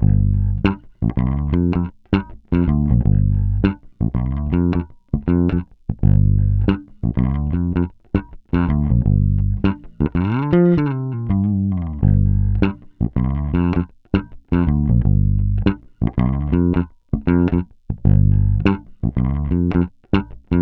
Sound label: Bass loop 80 Gm, Electric Bass Loops Direct; Tetra; Slate Digital BASSHOLE Sample Pack